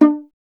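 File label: STR VLA D2, strings viola; Miroslav Vitous; Solo Instruments VOL-1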